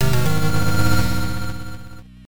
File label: Shock